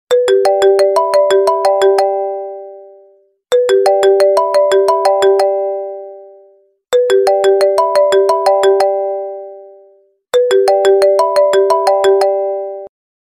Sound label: iphone ringtone; Apple